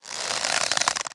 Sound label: Bow